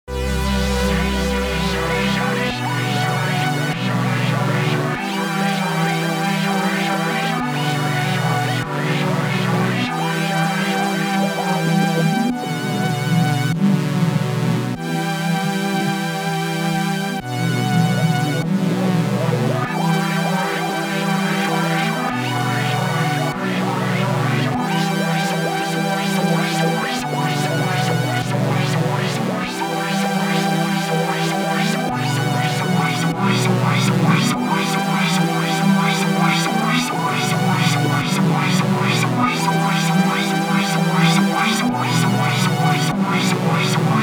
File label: Poly Filtergroove Dry Wet